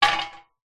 industrial sack truck trolley metal stand upright gently concrete